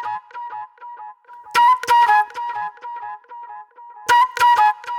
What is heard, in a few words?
NY 70's Delay Flute